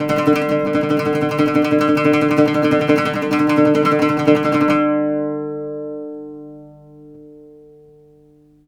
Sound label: LUTH 2 D3, Arabian Traditions; USB Soundscan vol.43; AKAI